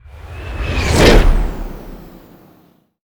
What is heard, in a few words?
Epic Swishes; SwooshSounds-2